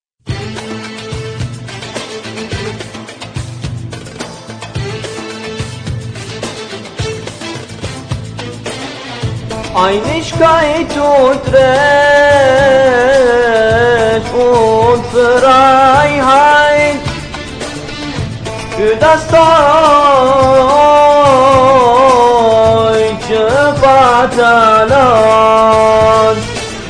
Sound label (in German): deutsche hymne